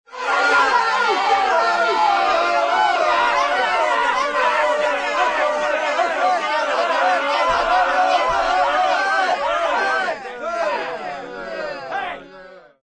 Angry Crowd, Angry mob, group of 20-30 people (human voice)